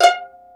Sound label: pizzi total, stringed; East West; Global Sounds